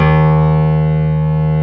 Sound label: KLAVINS D#2; East West - Global Sounds